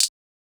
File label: PERC